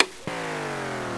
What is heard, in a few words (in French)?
erreur